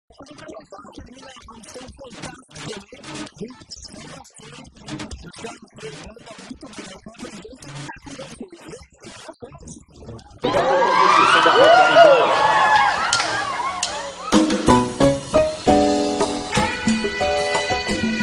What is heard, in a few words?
Samba Rock